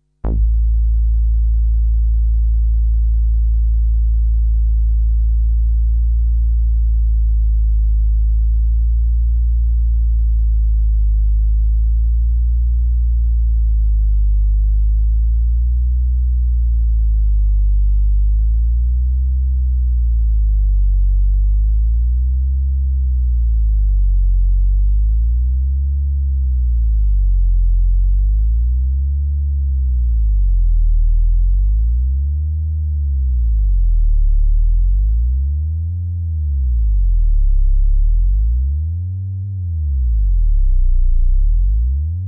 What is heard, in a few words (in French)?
arpeg sinus; 1.01min; Ondulations sinusoidale régulière